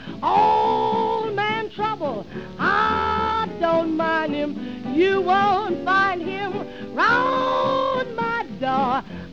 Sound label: rasp